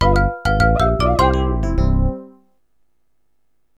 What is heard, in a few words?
Lose Life; Super Mario Galaxy complete soundtrack; Wii